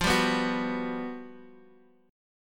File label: G Major 7th, F; strummed; JGuitar